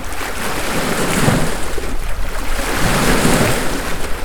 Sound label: waves